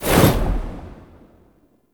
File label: Perfect Heavy Attack Proc, Weapons Melee